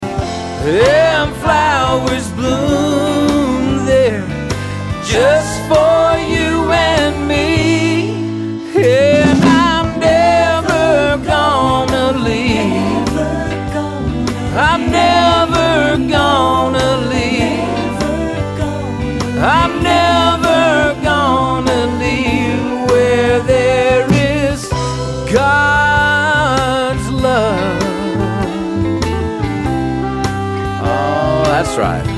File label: Country Road country